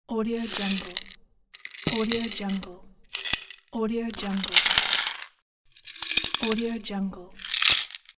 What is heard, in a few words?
piggy bank handling